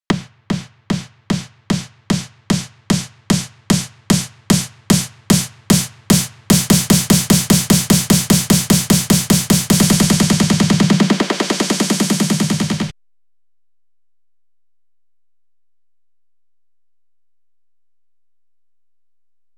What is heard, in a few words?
Build Up 150